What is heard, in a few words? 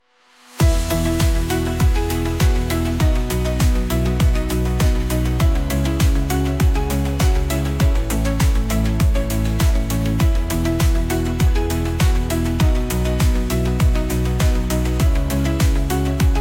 Techno Triumph